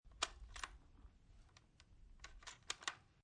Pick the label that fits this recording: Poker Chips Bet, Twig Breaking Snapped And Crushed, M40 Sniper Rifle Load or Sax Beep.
M40 Sniper Rifle Load